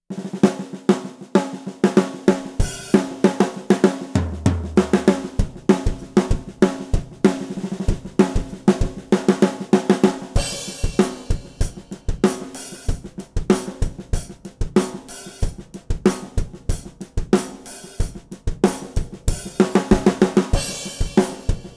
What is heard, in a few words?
Pearl Export 2 Snares On No FX